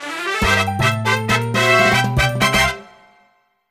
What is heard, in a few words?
Course Clear; NSMBU